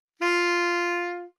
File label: sax f4